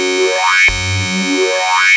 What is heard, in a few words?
OSCAR G2, synthesizer; Hollywood Edge - Giorgio Moroder Rare Synthesizer Collection